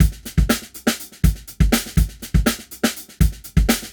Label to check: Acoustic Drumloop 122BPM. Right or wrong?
Right